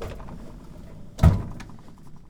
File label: Close Drawer